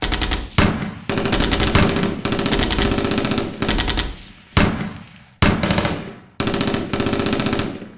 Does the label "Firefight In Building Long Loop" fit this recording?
Yes